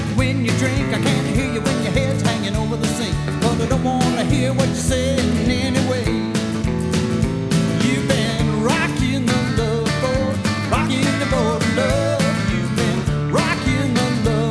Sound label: country